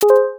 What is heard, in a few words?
text pop